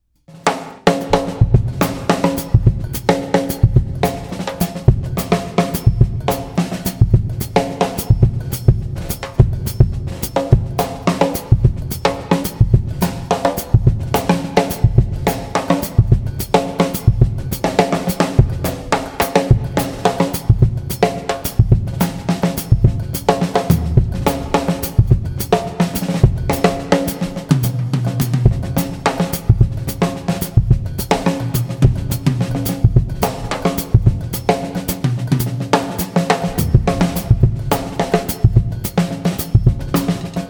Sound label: Drum Sample